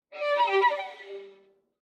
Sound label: Violin Slide Down